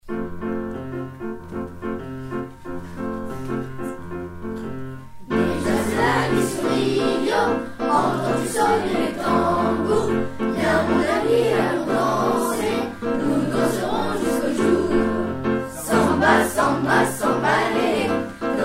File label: samba lele